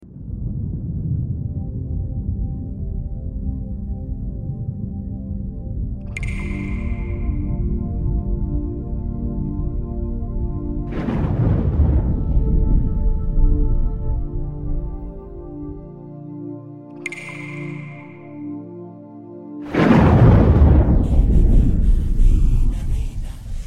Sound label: Raining And Drops